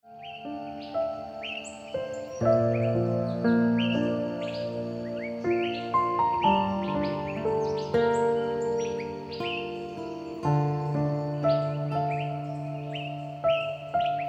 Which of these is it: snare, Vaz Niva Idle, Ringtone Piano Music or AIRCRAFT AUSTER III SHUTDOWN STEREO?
Ringtone Piano Music